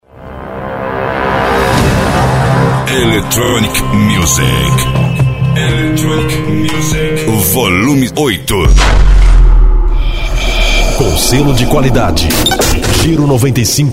Electronic Music

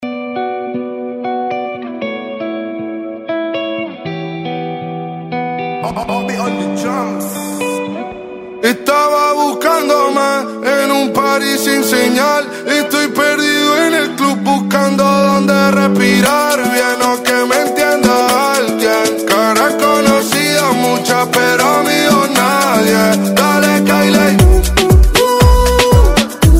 Reggaeton

